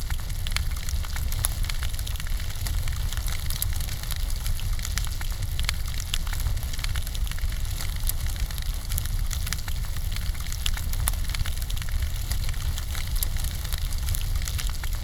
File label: torch